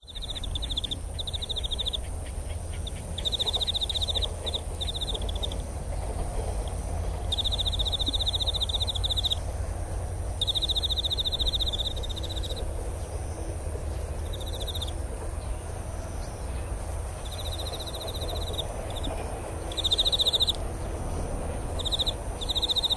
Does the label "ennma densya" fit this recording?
Yes